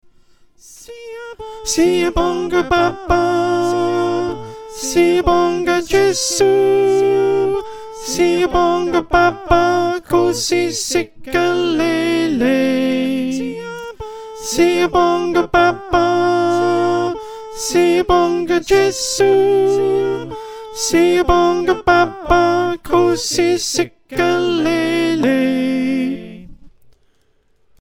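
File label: Alto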